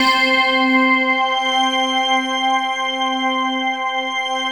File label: World of Synthesizers, SYNBELL